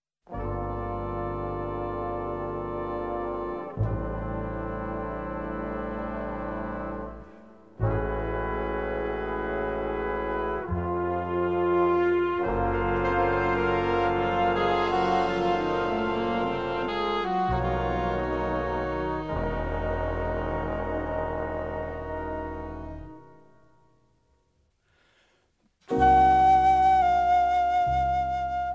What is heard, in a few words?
Kicks and Sticks